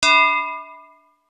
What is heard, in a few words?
announcement; notification sounds